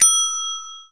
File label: Ding